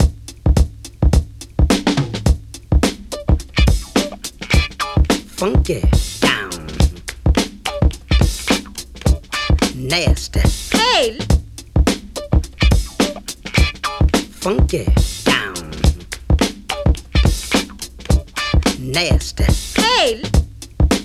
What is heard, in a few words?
106.2 Nasty Funky, breaks; Lockdown Breaks